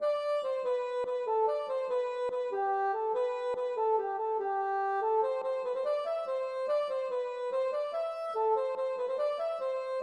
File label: polka